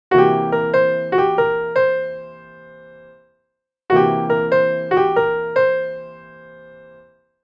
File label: blues notes e